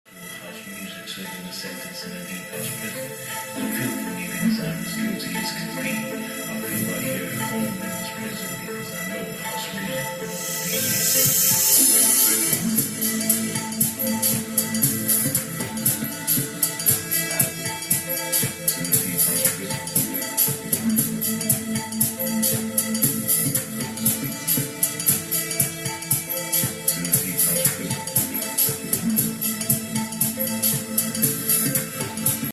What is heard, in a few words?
House Party Mix; Channel O Lockdown